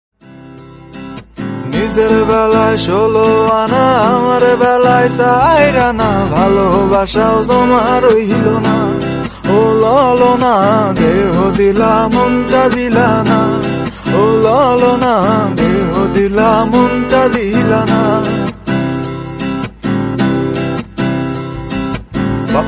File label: BENGALI SONG RINGTONE